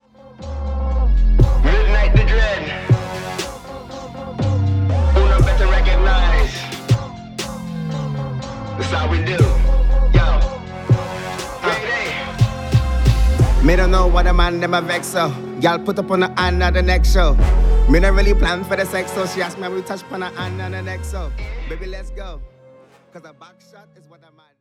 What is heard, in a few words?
Jamacian Traphop